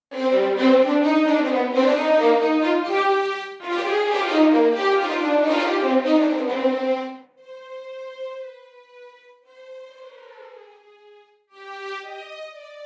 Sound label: First Violins Test; LSS